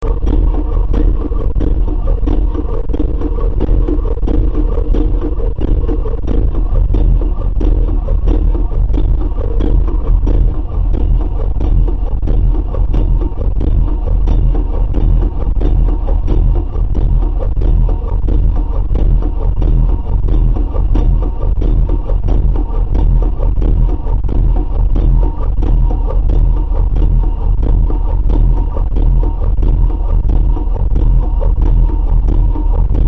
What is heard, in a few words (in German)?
Ventile medium